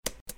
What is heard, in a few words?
key